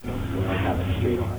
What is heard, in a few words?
murmur